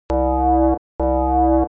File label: science fiction space warning alarm; industrial sounds